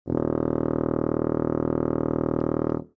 tuba low

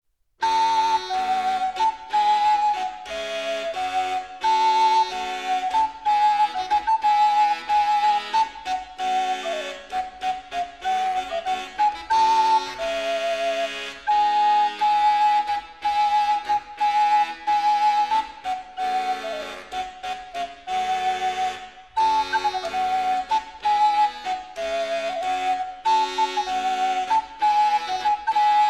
bassa danza

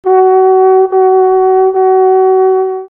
Sackbut

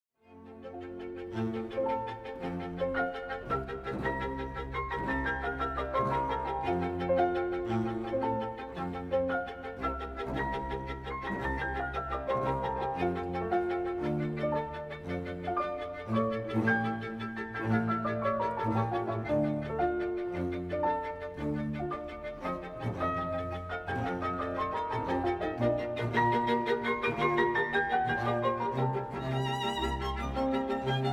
grand

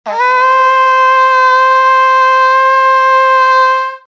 Rkang-Gling

